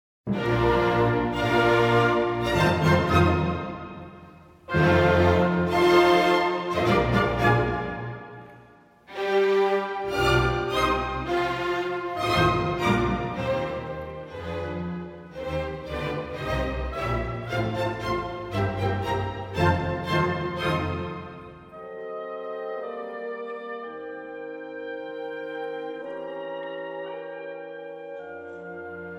Sinfonia Concertante for Wind Quintet and Orchestra in B flat Major